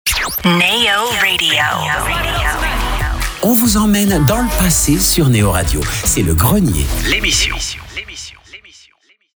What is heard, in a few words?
JINGLE; GRENIER L EMISSION